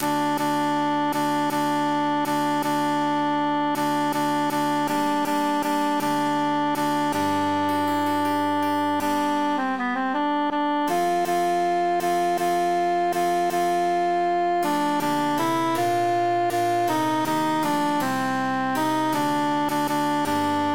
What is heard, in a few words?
altus emphasized; chi passa